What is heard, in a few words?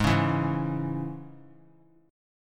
Ab Suspended 4th Sharp 5th chord; 3C,3F,3A,3D; strummed; JGuitar